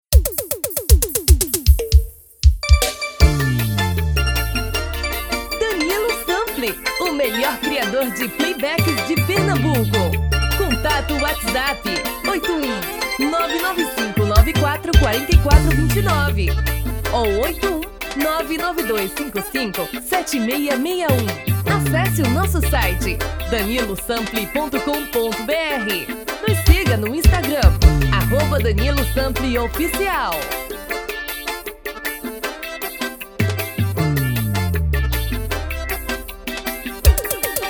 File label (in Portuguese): Piseiro